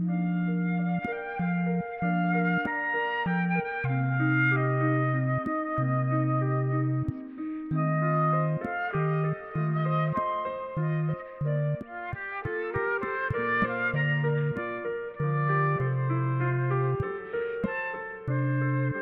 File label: Bach Siciliano, ringtones, Calm; Samsung Galaxy-S25-Ultra